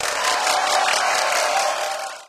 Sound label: crowd cheer